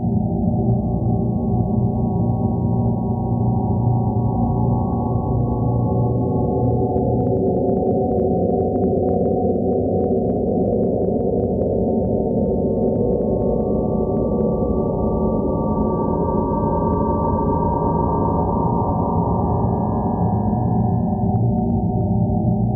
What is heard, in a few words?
Disorienting Drone Amb; Warframe; Orokin